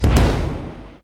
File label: Start Charge Forward